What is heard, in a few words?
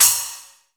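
CYMBAL, DRUM KITS; InVision Interactive; 40 Oz Phat Slammin Hip Hop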